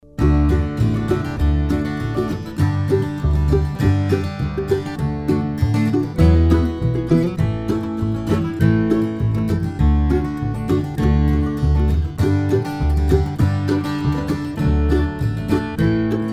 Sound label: new york chimes